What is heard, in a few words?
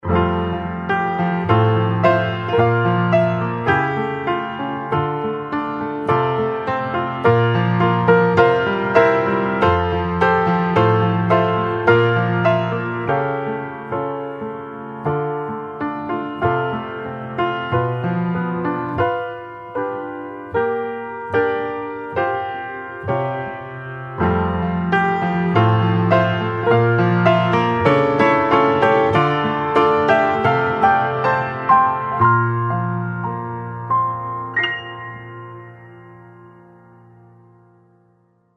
Ringtone Instrumental